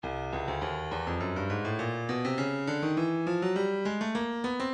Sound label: breakbeat midi, 102 bpm; cruz e; Stylus Breakbeat Midi Files